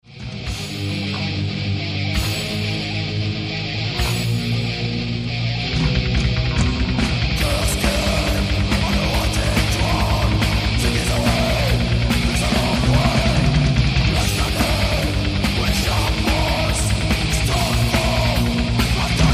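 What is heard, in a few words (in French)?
guitare électrique riff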